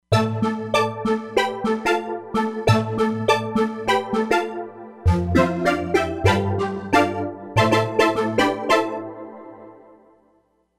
Balithang, E-mu Proteus-3; Factory patches

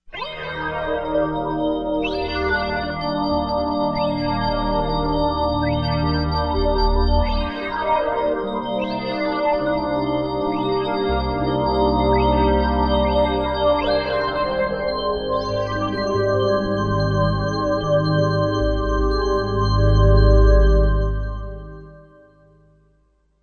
Wave Rings, Korg MSC-07S Synth

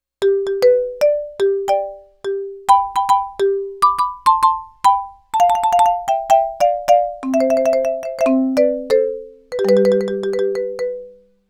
Marimba

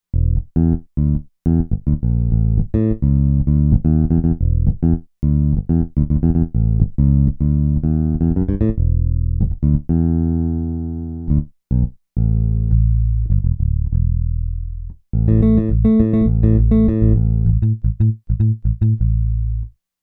Finger Bs, Roland Fantom XR

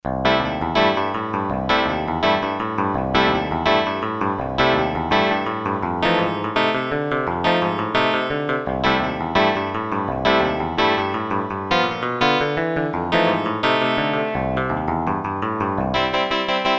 GUIT DDL; Korg DSS-1; Electric Guitars

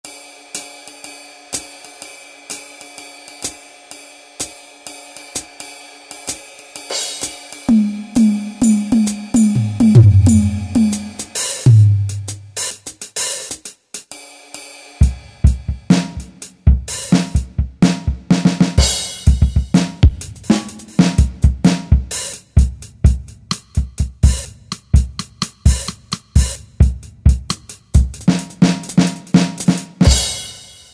Jazz Kit; Yamaha DJX; Voices

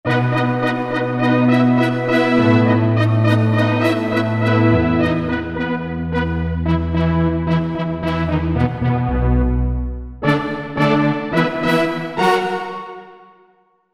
2 voices; Korg MSC-12S Synth 3

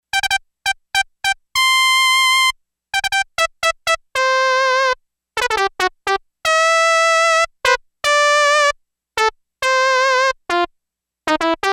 Trumpet, Yamaha DX11; Factory Preset Voices

